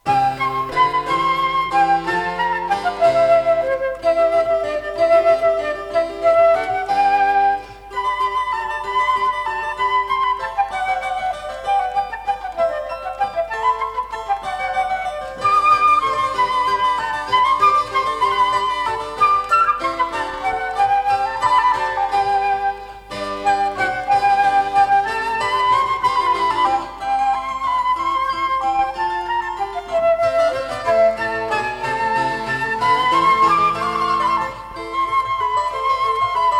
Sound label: Harpsichord Sonatas No. 3 in C Major